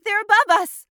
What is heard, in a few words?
Flying; Realm Royale; Mage